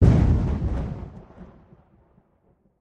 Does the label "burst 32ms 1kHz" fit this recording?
No